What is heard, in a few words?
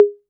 808 CONGA HI, Techno Trance; E-MU Formula 4000 Series Vol. 2; OrbitPercMaster